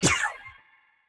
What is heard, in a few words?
Purple Laser Shot; Game Weapons; Military; Game Essentials